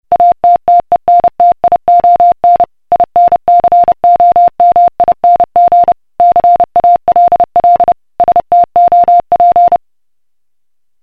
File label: Morse Code Call